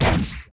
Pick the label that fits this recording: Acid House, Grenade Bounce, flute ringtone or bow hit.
bow hit